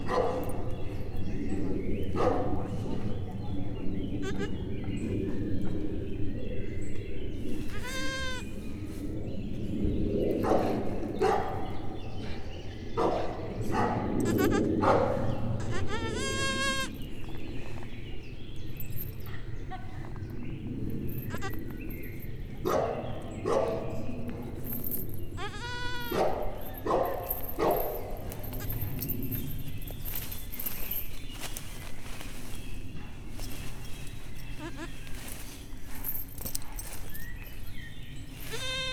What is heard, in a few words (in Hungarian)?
gida abajgatása, házi kecske; Egerturistaház